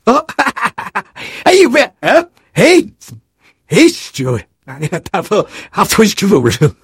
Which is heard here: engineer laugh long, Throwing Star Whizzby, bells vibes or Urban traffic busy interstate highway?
engineer laugh long